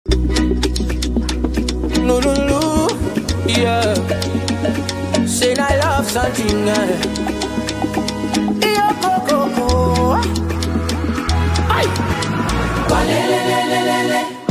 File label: Loud Urban Choir